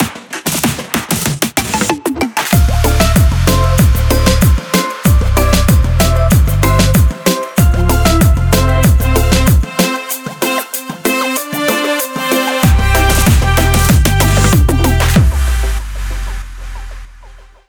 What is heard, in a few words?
Jingle